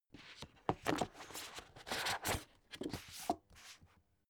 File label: book shelve soft long; haus garten; wohnen